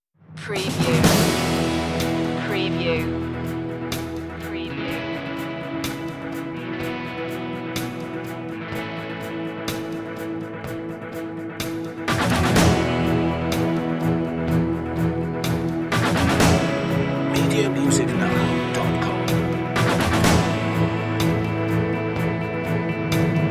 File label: underscore